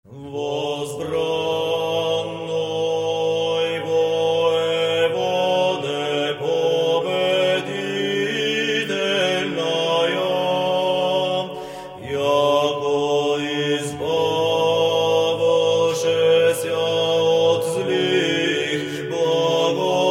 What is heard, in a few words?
Bulgarian Liturgy, Eastern chant; mode 8; Vsbrannoi voevode (O, Queen of heavanly host); orthodox music